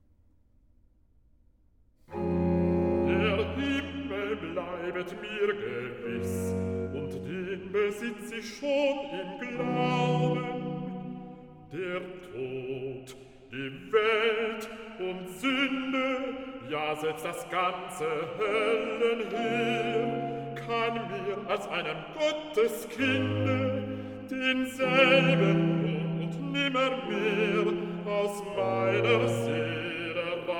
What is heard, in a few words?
Recitative; Sehet, welch eine Liebe hat uns der Vater erzeiget, BWV 64 Recitative Der Himmel bleibet mir; Bach; J S Bach; Cantatas, Vol 13